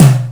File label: Tom Room